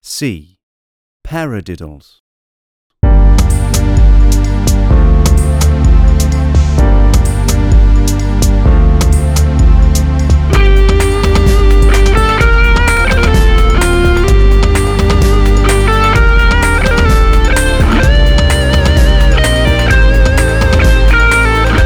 Paradiddles, exam music

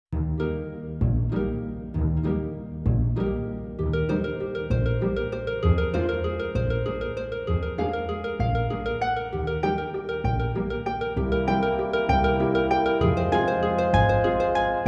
Warm-Up Waltz, exam music

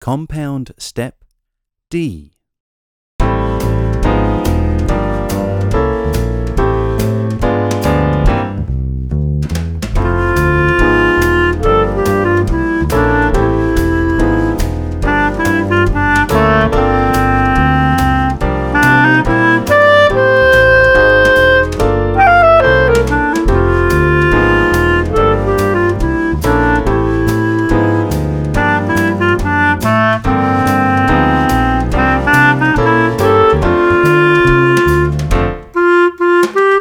Swing

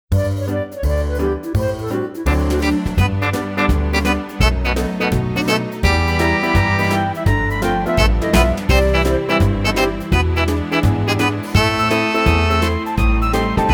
Bounce And Jump, exam music

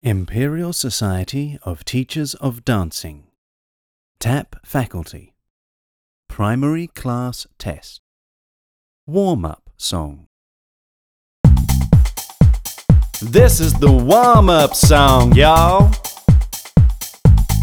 Warm Up Song

